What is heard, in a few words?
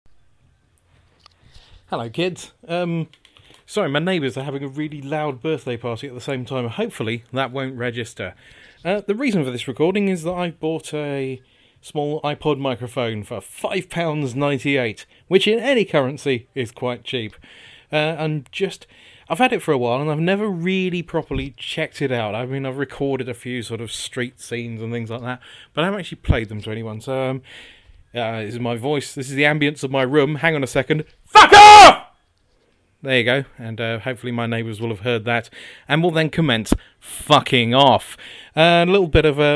ipod microphone test contains acoustic guitar yelling and my voice